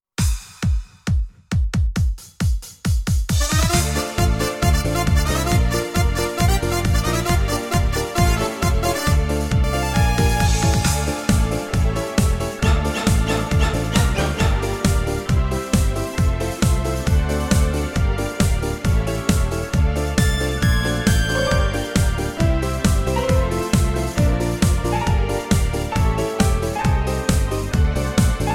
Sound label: brass minus